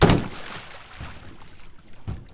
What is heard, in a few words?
water slap